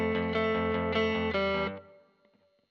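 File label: Guitar Royer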